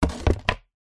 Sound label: sport skateboard set down on deck; zapsplat